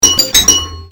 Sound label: GameOver, pinball